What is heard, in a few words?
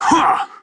jump